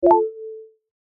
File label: incoming tip